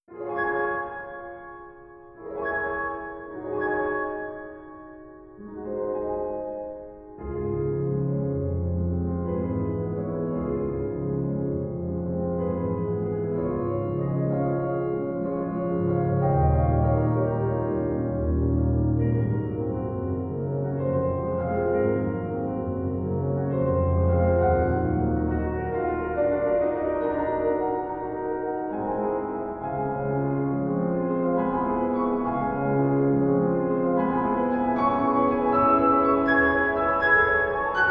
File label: Wet Chapel; Communion Chapel; illusion